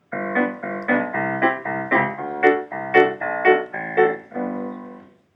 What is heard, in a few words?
G GBC CDbD C B A G bass and chords, piano